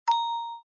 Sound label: button; egg fx